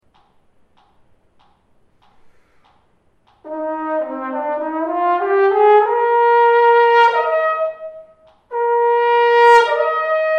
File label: high range song, trombone; Rangesong High E-flat 4; etude; Rangesongs